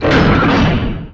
Hatch Large Metal Open; Doors

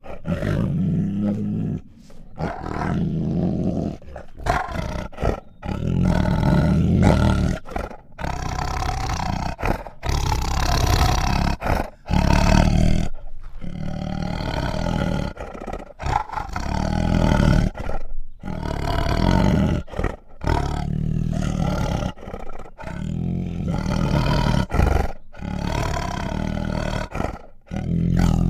Female Bengal Tiger Growls and Snarls; Sound Ideas; Tigers Sound Effects

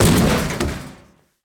Bodyfall Hollow Metal; SFX Kit; Bodyfalls

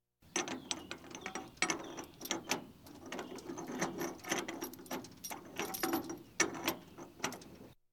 Police, Fire engines

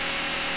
Sci-fi Door Loop; SFX Kit; Sci-Fi Loops

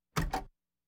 Automobiles various

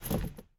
driver foley wheel turn; Blind Drive

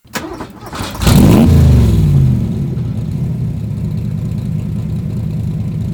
66 Cobra Start Loop, Automobiles

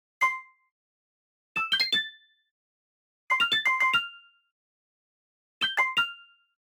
Arcade Click Positive

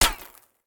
gun miss; Blind Drive

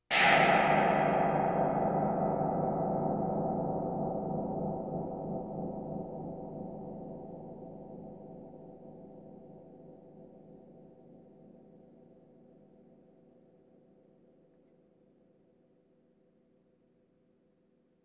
Percussion Bongos Tympani rolls Gongs Drums

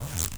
ZIPPER Short; FABRIC & CLOTHING; mono; Universal Sound FX

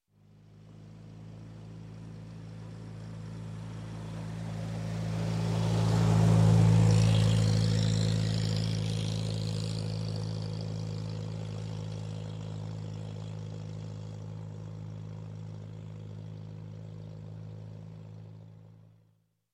Tractor, Gas Pass By, Fast, Industry, Farm; Sound Ideas 2000 Series

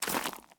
zombie footstep mud; DeadFright

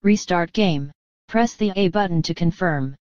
menu; DeadFright

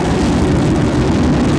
Plane Jet Loop; Airplanes

